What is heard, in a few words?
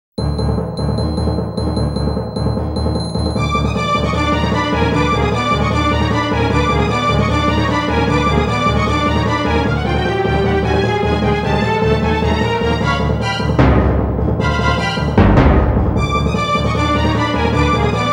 BOSS BGM